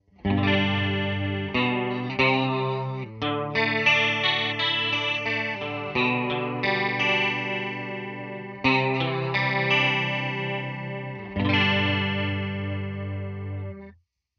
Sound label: Chorus Deep; GFS Effects